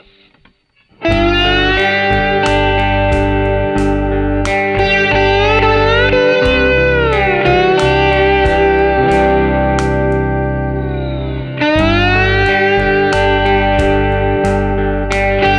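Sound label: slide guitar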